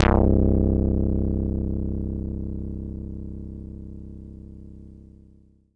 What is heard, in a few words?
Casio CZ-5000 Synth Bass C1